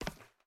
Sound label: Step heel Wood; MiSide Demo